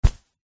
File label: footstep single walking on thin fabric; zapsplat